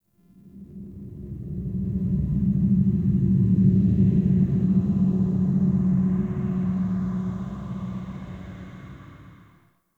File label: Exterior Eerie; Ambience; Duviri; Warframe